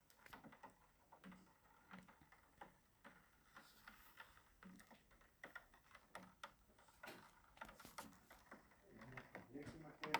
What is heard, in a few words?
Rain